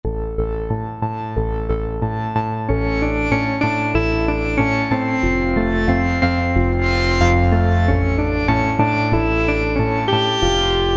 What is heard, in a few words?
Wave Mix, Cheetah MS6